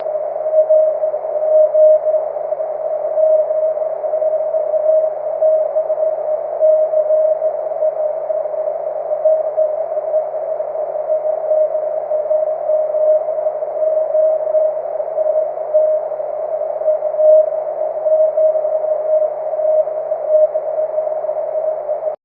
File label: cw